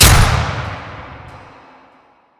arena lights off

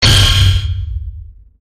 security bot break beam; gow

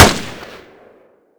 m4a1 unsil, hk416

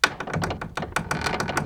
window, houses; soundscapes

